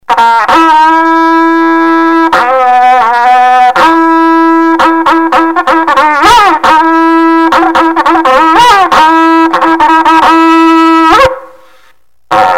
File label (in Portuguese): Toque Berrante; Rodeios; Esportes; rebatedouro